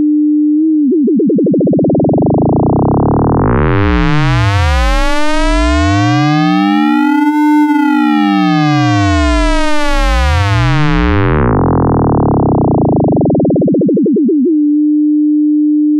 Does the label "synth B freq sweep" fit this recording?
Yes